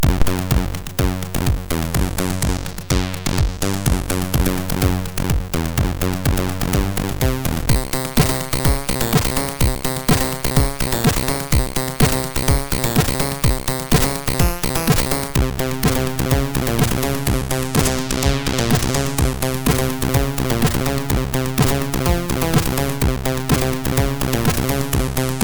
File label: Techno Rave, MOS6581R3